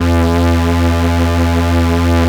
3100 PWM E 3, Korg PS3100; Back In Time Records; Korg Universe VOL-1

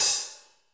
HH hh; Best Service Gigapack 2

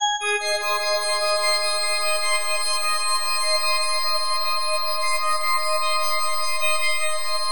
G# MAJ; Bolder Sounds

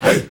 HEY HEY

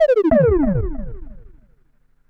Perc Synth Moog Delay

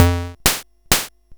Waltz, Hing-Hon EK001

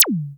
EFEX, FX DRUM

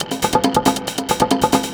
drm 138, Techno, drum-loops; Techno ID; tribal-meeting

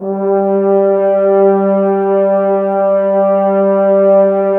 Brass F.Hrns; Miroslav Vitous - Woodwind Brass Ensemble

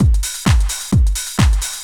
dr t 130 trance 2; Ueberschall; Techno Trance Essentials; DRUMLOOPS

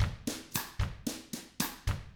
COUNTRY, Drums; ILIO; Double Platinum Drums 1

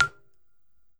BALAFON, African Melodic

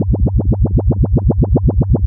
Noise, LFO & Noise

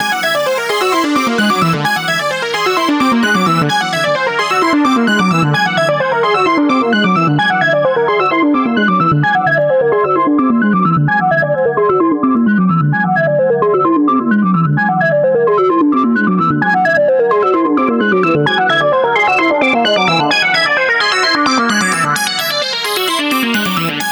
NRG Sweep, Trance

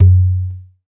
African Perc; CNGO; Spectrasonics; Supreme Beats; African Contemporary; SB Afro DRMs

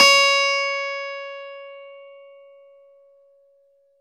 CLAV; Roland LCDP10 Keys of the 60s and 70s 2; Clav DB

